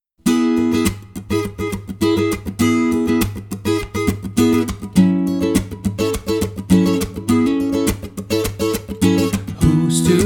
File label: solo unplugged